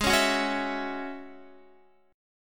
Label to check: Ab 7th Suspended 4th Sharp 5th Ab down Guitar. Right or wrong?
Right